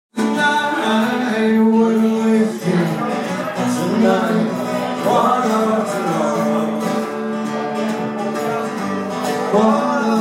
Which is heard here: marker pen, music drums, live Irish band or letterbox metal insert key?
live Irish band